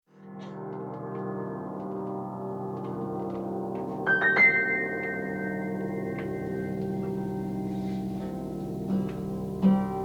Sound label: CLAP THE RHYTHMS; Ken Garkow; Clap The Rhythms 1